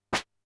snare, Drum Machines, Formanta Radio Plant Polivoks